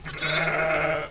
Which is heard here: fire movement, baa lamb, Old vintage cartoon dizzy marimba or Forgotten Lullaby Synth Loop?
baa lamb